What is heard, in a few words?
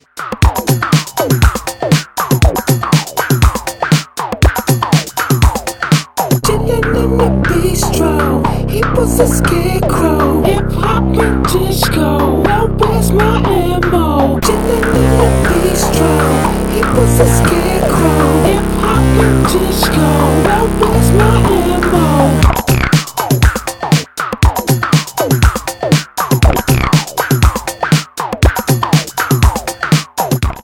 acid version